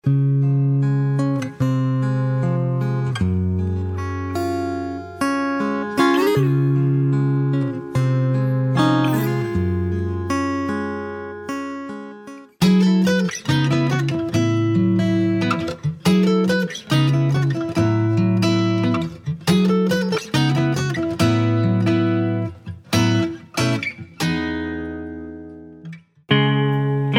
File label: acoustic guitar